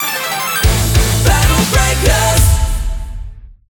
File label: Victory Stinger